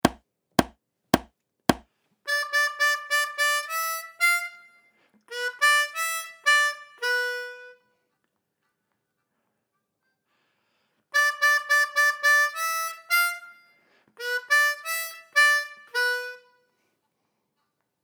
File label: Harmony, harmonica